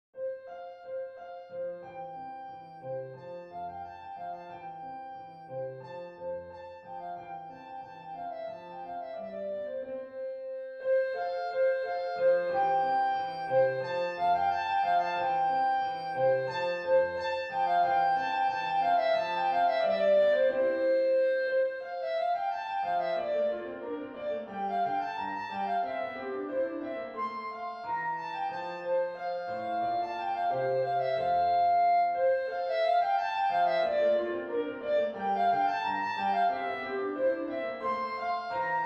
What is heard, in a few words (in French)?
gavotte majeur; corelli arcangelo